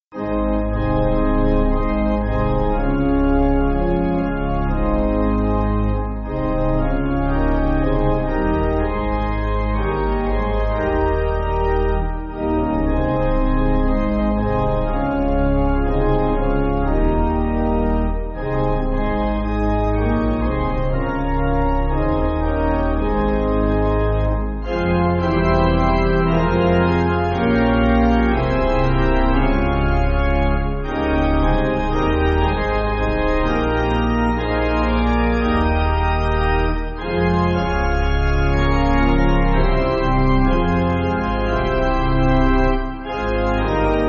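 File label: Pipe Organ, Backing Files for Churches; Edom 8.8.8.8.D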